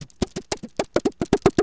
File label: TAPE VARIATI; Rarefaction; Poke in The Ear With A Sharp Stick